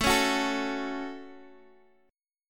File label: Db Suspended 4th Sharp 5th